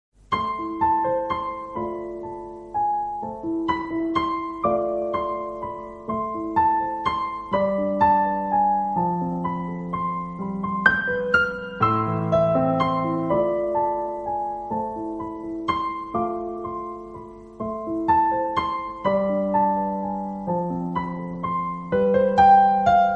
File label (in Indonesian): romantis nada dering indonesia